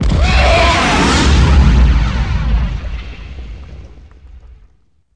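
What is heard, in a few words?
combat explode tie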